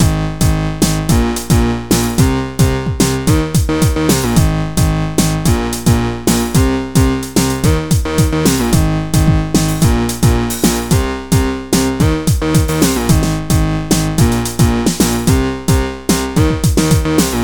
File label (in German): Oszillator Dancehall